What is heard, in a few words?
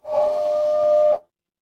instrument whistle